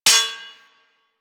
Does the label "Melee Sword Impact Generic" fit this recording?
Yes